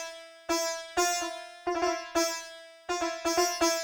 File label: ethno, 125, A